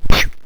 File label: miss; Castle of Xhentars